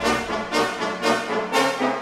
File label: Orchestral Loops; 128 VERTIGO